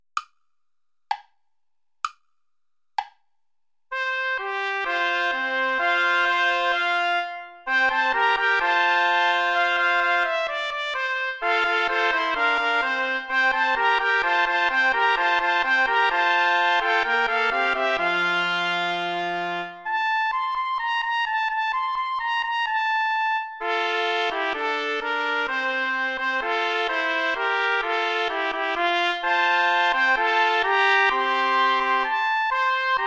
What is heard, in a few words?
play along without trumpet; praetorius michael; psallite unigenito